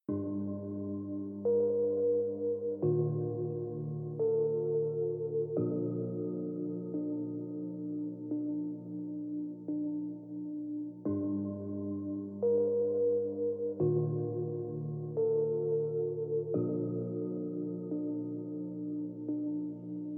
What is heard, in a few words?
33.35.37hz Low bass screwed